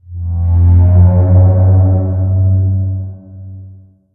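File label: underground metal; blackforest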